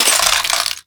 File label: fs fm glass, footsteps; player; Portal 2